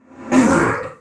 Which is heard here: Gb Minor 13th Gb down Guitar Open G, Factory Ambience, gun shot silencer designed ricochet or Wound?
Wound